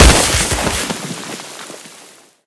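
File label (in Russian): громный чёткий прыжок в воду с пирса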